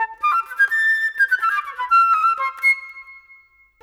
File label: Flute; Sonic Foundry (Sony Creative Software); Orchestral VOL-2; Modern 27